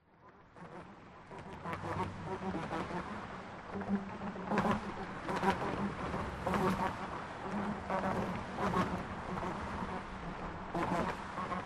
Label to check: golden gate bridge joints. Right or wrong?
Right